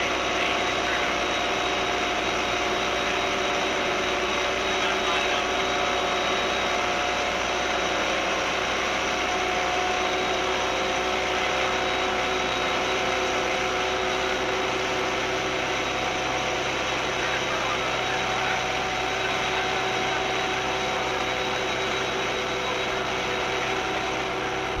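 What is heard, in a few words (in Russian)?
машинное отделение сильный гул от турбин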